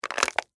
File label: Crack Crunch Celery, Texture, Crack, Crunch, Celery; Twist, Stalk; Food; BlastWave